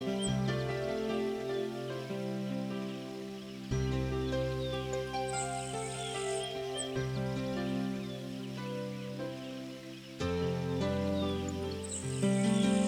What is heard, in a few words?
Mountain Paradise Songbirds